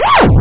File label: Saucer; RivalBall